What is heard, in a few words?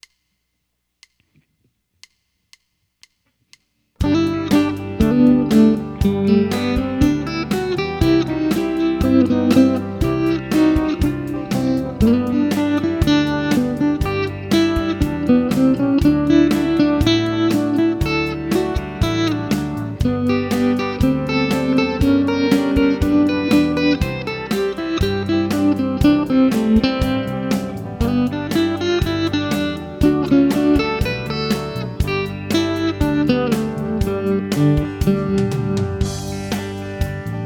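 Country Solo Backing Track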